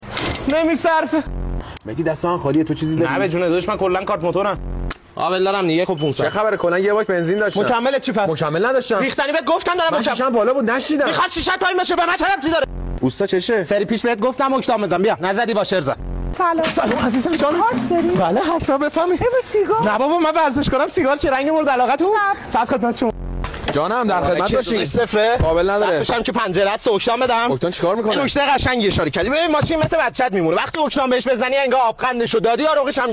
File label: Typical Internet Cafe